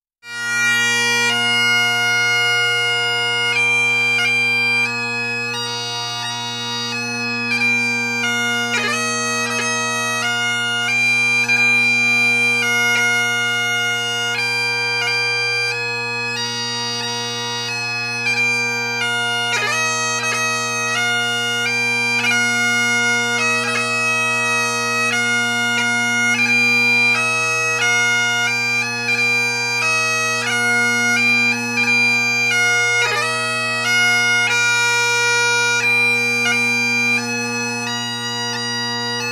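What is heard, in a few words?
chamber music string quartet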